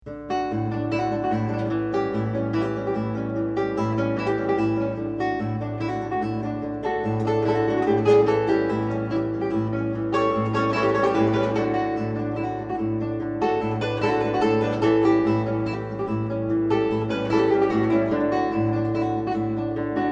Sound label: merengue, guitar